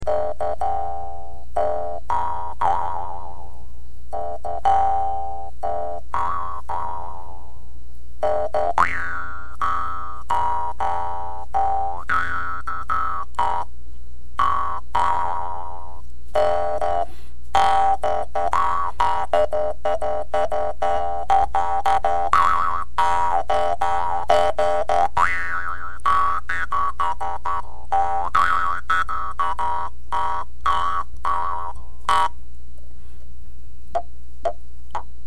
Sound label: Jew's Harp, Happy Birthday